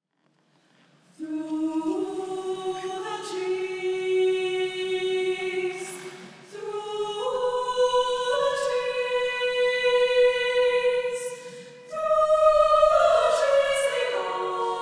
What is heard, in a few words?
mistletoebird live